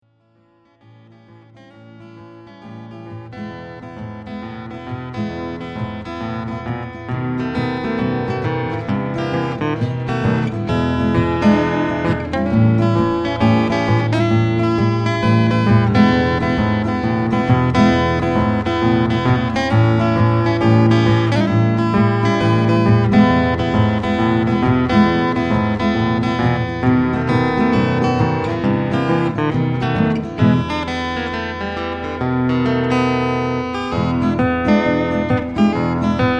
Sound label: blues